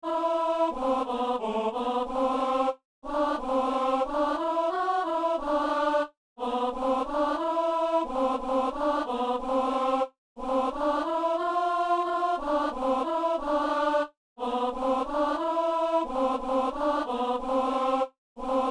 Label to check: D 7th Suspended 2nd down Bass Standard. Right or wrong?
Wrong